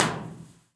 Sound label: Batcave, Lexicon 480L Reverb, Impulse Responses; Big Gee's